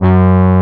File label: G2, ANA HRN